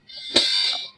chains; quake2; coop

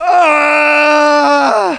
scream, slaughter; quake2; coop

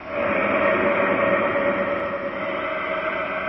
breath loop; quake2; railz; ambient

